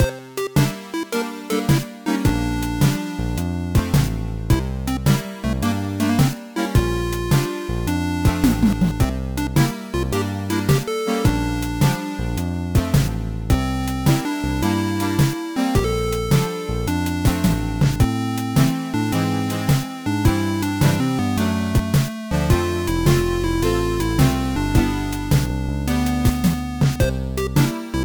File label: Shop Loop; high strangeness; wii u